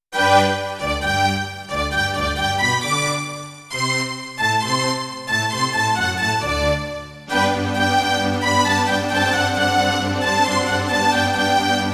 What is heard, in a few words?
Kawai GMega; classical; Mozart; Eine Kleine Nachtmusik partial